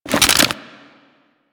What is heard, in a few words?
Grenade Pickup; Grineer